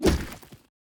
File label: order move